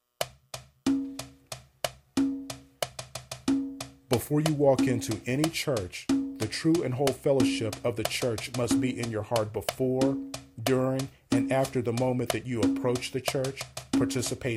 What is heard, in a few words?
Single Bongo Version; Religious Theme